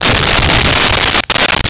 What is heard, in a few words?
teleport on